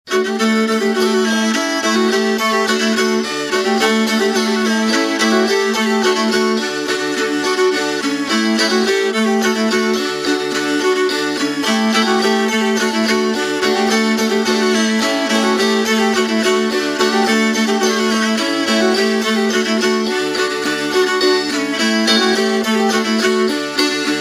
Labajalg Polka; Unt Soo Taga

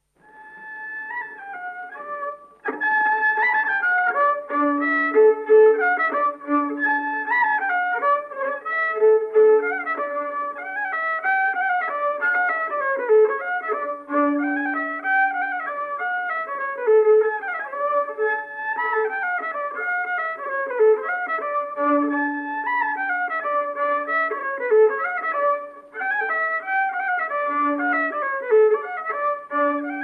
Labajalavalts viiul; Urvaste; folk; Otto Hiiop

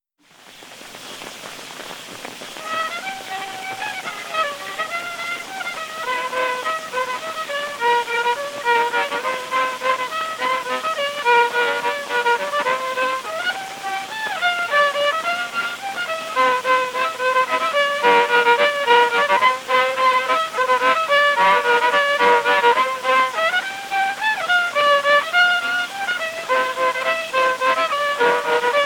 Rialeik Rehelugu viiul folk; Ruhnu; Henrik Berensson

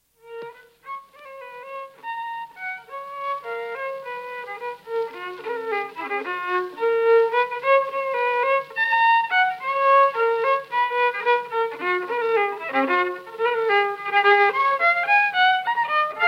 Hara valts viiul; folk; Kuusalu khk; Gustav Lindstrom

